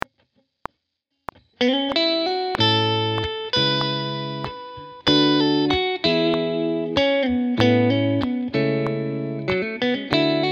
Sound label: Blues Solo A Major I iii IV V